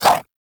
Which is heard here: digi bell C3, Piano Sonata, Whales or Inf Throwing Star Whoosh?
Inf Throwing Star Whoosh